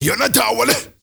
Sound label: MALE RAV, MALE NOISE, Heart of Africa; Spectrasonics; Heart of Africa 1